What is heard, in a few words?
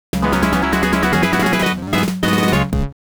game over; Mega Man The Wily Wars; The 16bit Audiophile Project; Genesis